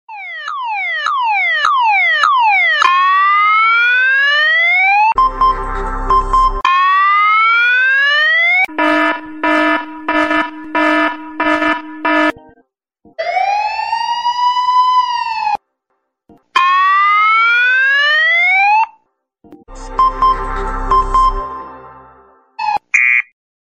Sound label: Alarm Loud